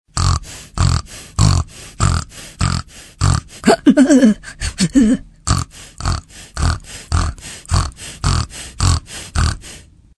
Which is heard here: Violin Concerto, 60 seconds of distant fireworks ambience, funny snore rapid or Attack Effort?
funny snore rapid